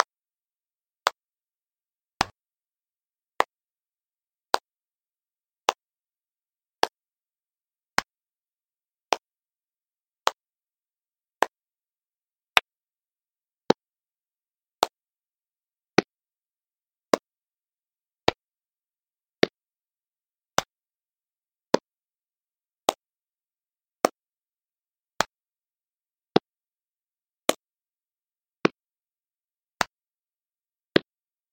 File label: clap short; STOLTINGMEDIA